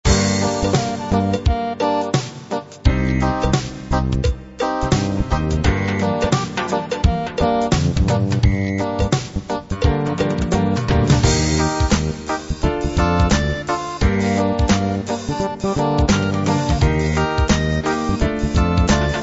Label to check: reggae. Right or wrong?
Right